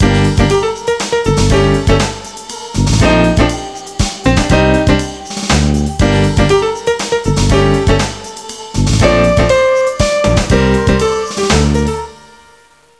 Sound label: JAZZ